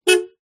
vehicles car hyundai trajet horn beep single external; 2006